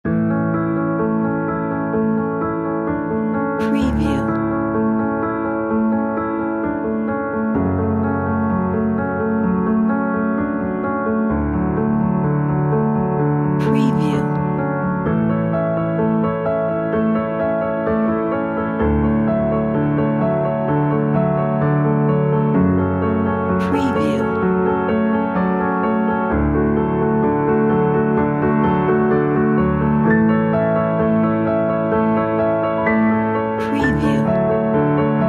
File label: inspirational piano